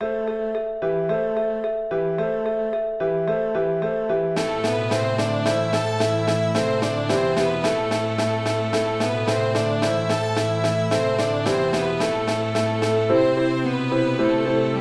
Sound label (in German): Streicher; Maienwind am Abend